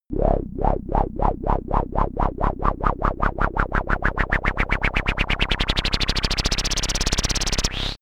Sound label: low rumble; bewegung; comedy-cartoon; soundpool